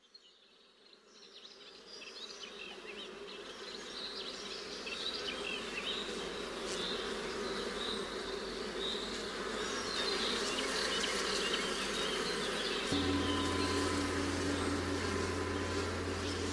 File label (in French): musique chamanique tambour